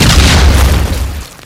water explode; Ace of Spades

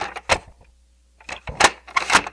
semi reload; Ace of Spades

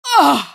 pain, female; Garry's Mod; hl2

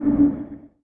Gunship Run Loop; Sid Meier's Civilization 4 Complete; Beyond the Sword; Final Frontier; Units

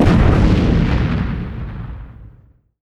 ship hit big effect; Hearts of Iron IV Death or Dishonor

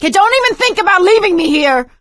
ledge hang middle; Left 4 Dead 2; producer

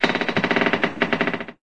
wood floor squeak; random amb sfx

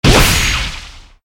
cutter; Alawar; Star Defender 4

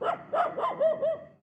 dog; Mount&Blade Warband

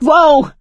ledge hang slip; Left 4 Dead 2; producer

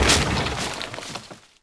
Trebuchet Stop Leaves; Sid Meier's Civilization 4 Complete; Warlords; Units

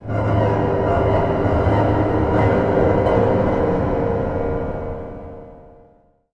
infection, music; Left 4 Dead 2

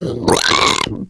female boomer warning; voice; Left 4 Dead 2

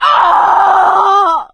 hurt critical; Left 4 Dead 2; producer

